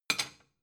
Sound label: plate small shelve